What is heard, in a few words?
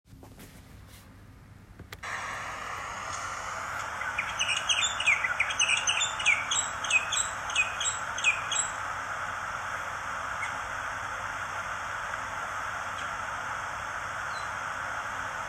Mountain Grackle Song